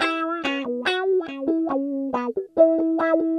070 Pop Guitar Wah C# Min, Electric; Pop Rock; Electric 055-099 bpm; Pro Tools Essential Loops; Digidesign

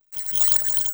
rythm

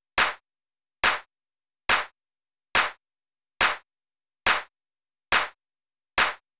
140 House Clap; Dance DJ; Percussion; Digidesign; Pro Tools; Pro Tools Essential Loops

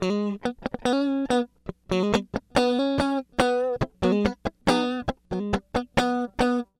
070 Pop Guitar S-Note C# Min, Electric; Pop Rock; Electric 055-099 bpm; Pro Tools Essential Loops; Digidesign

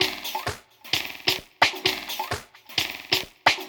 130 Dance Perc Fx; Pro Tools Essential Loops; Dance DJ; Percussion

